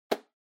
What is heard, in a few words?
Tablet PC Catch